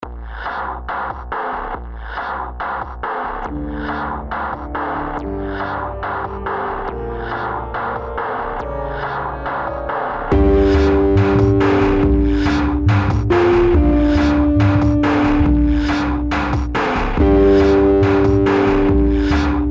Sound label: Energy Action Example